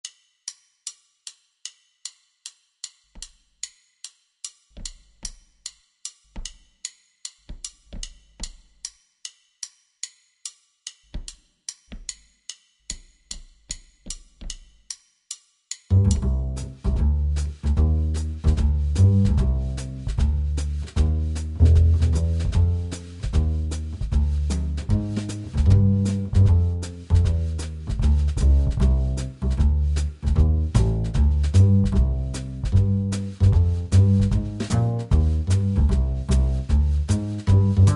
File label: minus piano